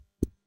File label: tap finger; ui